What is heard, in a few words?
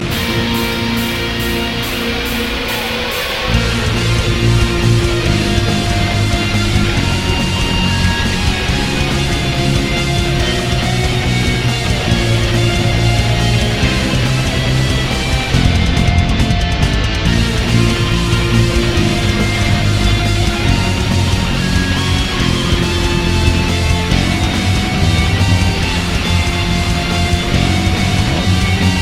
metal remix; legend of zelda